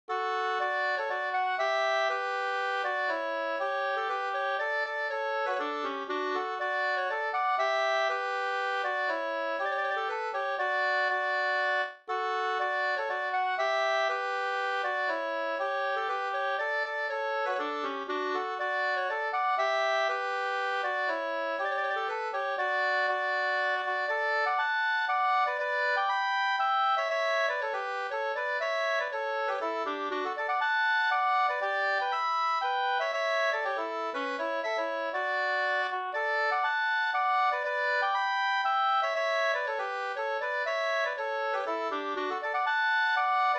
hamburska

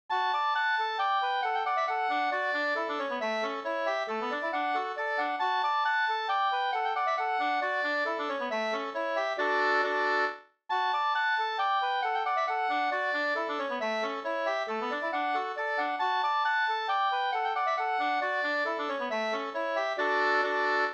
mazurka, 2st; Mazurka av Anna Norman

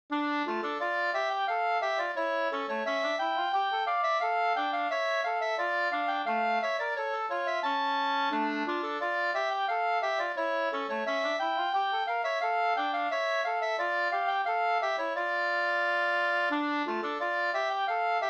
polska fr Lappfjard; Lappfjard fi

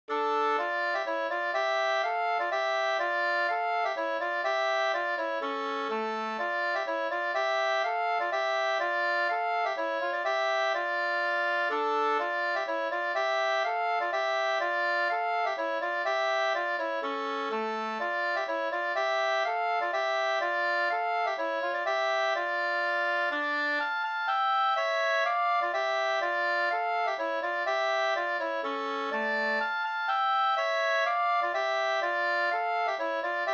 polska, 2st; Fritjof Mesch Hj

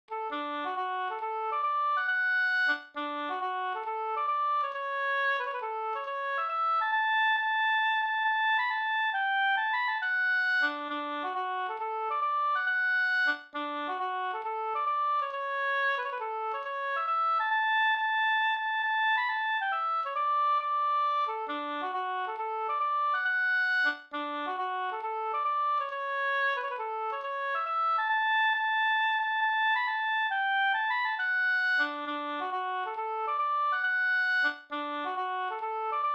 hambopolska

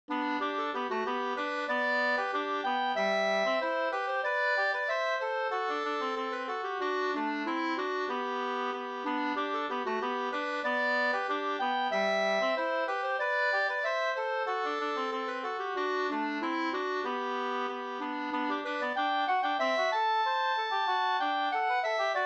ganglat; Bevaringsmarsch; Mattias Blom Hs

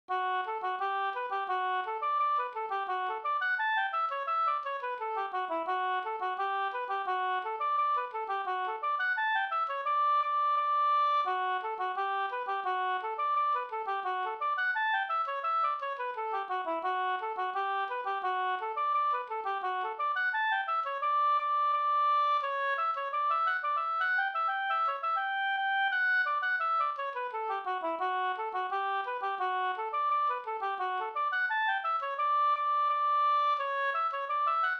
engelska

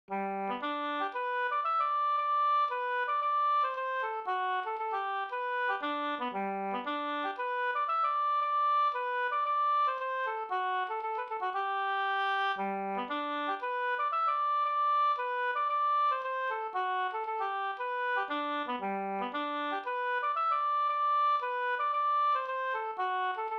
hamburska; Randig kjortel Sd

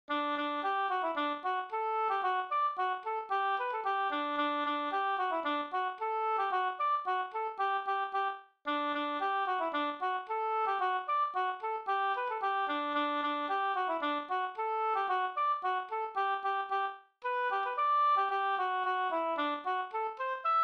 polka

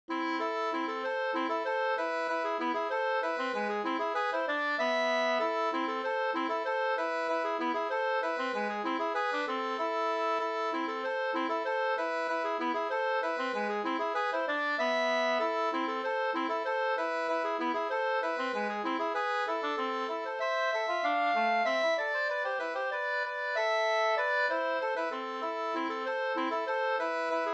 jenkka; Vihtorin jenkka Nb 2st

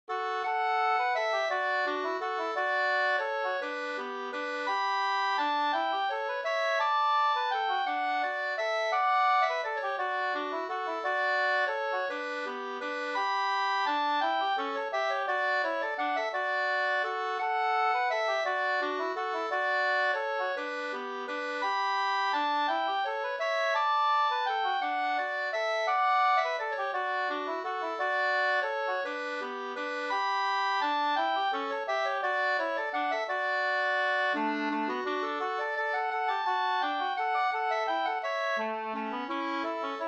vals

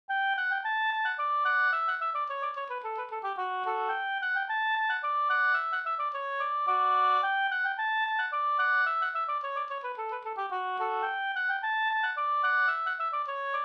slängpolska bleking

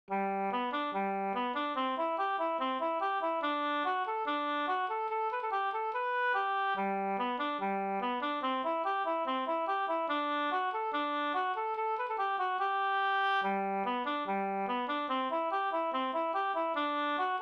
stjuls, Gdur

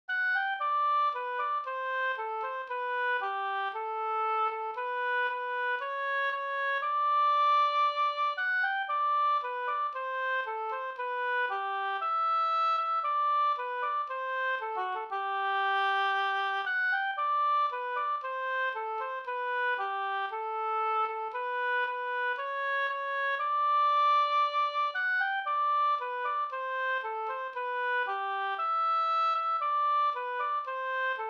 skanklat

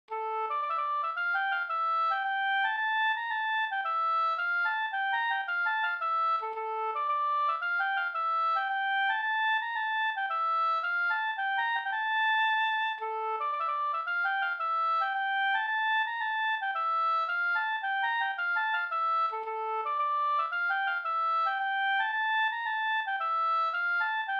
polska; e Per Lindstrom Sjalevad An

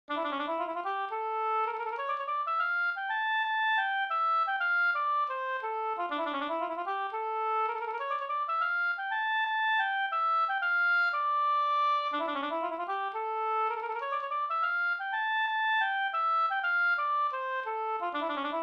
polska storpolska

